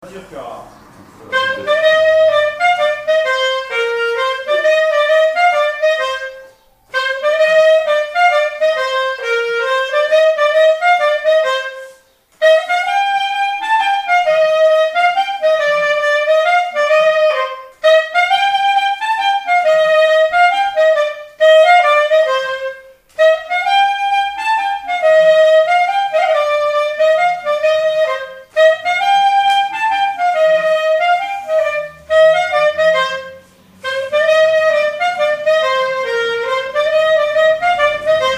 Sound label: rep mazurka, clarinette